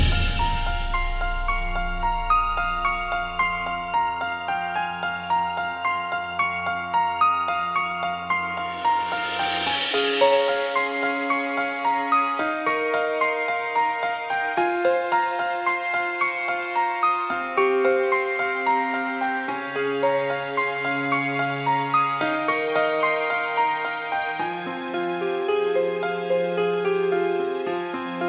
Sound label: instrumental+choir